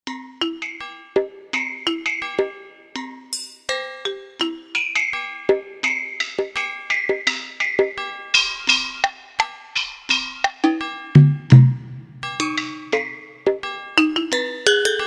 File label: Tuned Drum, Korg M1; Factory Patches